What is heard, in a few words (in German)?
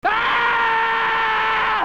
Killer schrei